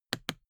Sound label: briefcase plastic latch lock close unsteady; industrie wirtschaft; bueroarbeit